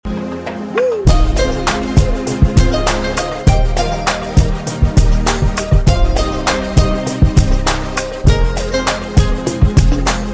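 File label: melody for SMS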